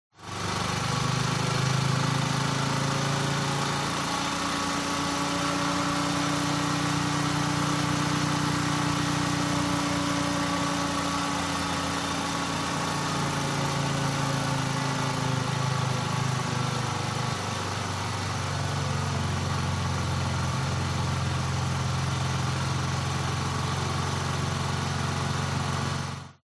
TRANSPORTATION MOTORCYCLE Engine Accelerate Decelerate